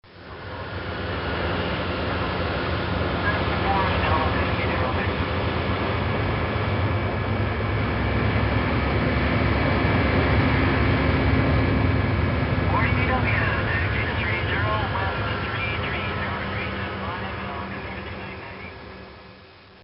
Airplane Ambiance; Airplane Sound Effects; Vehicles

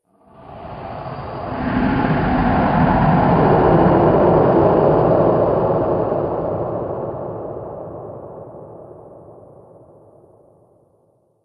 Horror Ambience; The Dark Fear; Scary Soundscape; Andrew Fleming

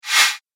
Trailer Accent

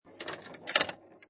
Pinball Sound Roll And Multiple Clanks; Video Games; Lynne Music

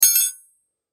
Wrench Drop Set Down Metal; Hand Tools; INDUSTRY; Blastwave

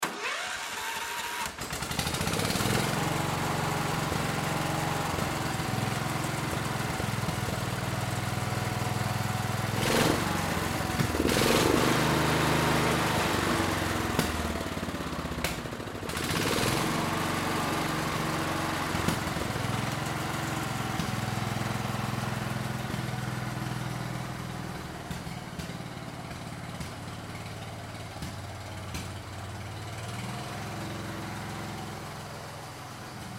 Tractor On Run Off, Tractor Start Up Run Shut Off; Tractor 1969 Econ Tractor Start Up Run Shut Off; Industry

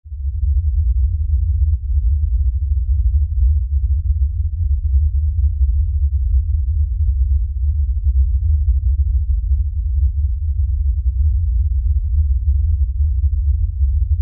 LFE Rumble, Lfe Rumble Low Bass; Blastwave; Sound Design

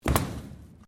Bricks Metal Movement; Material components for constructing more complex sounds

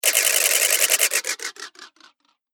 Game Clockwork Engine, Video Game Sound Effects

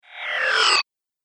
Magic Power Down; Magic Sound Effects

